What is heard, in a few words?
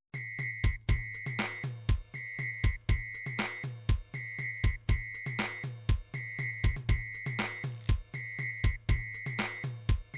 drums, midi drums